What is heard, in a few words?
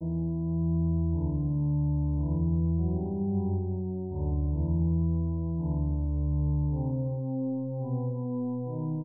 keys waltz, sad loops